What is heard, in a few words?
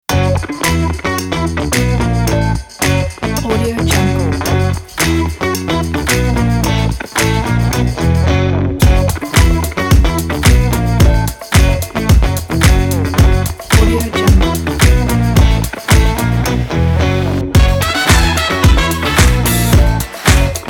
funk